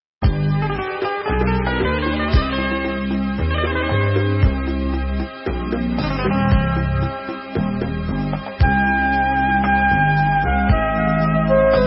Instrumental

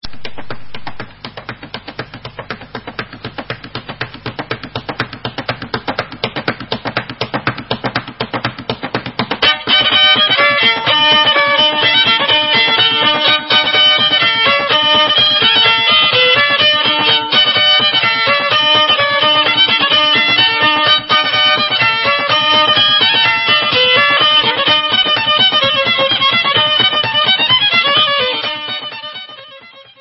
Canadian Instrumental

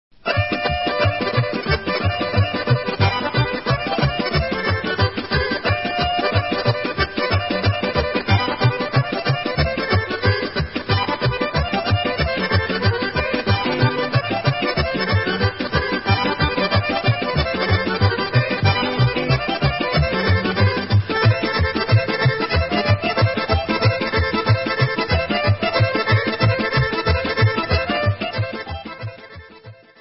Rumanian Instrumental

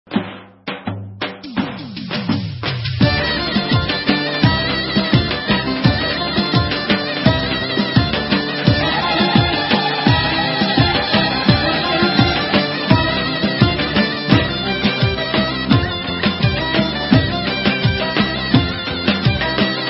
Gelin Halay, Turkish

